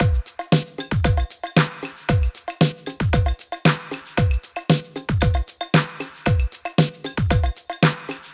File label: Drumloop, E 115bpm; Zero-G; Nu Disco; Construction Kits